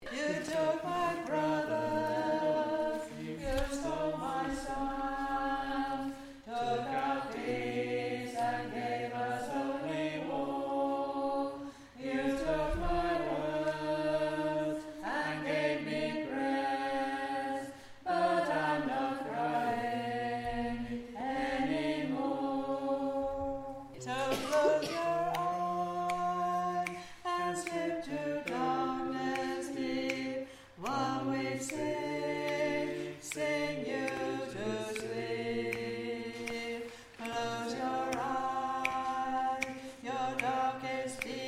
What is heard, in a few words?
Bass